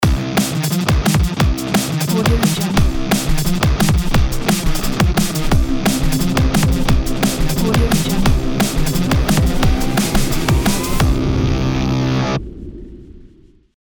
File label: the drum and bass rock logo